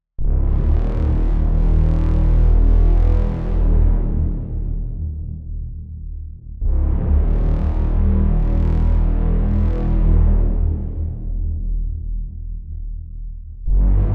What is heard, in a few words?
kriegshorn nordic warhorns